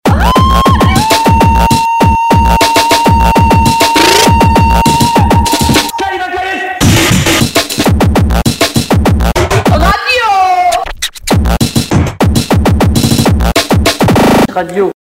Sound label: jingle